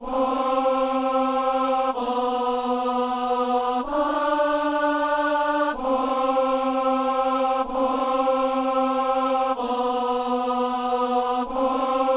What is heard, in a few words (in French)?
amen, alto; Messe de Saint-François-Xavier - Amen